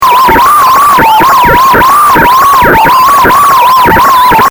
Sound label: fm synths